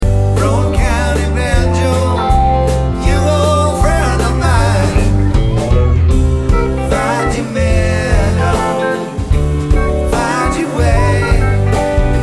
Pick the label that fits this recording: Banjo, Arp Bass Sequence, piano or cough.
Banjo